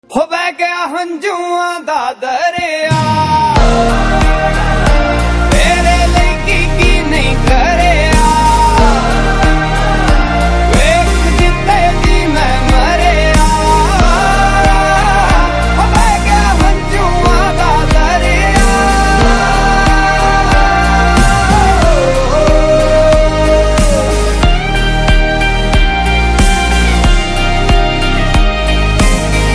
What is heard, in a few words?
BG Ringtone; Manmarziyaan Movie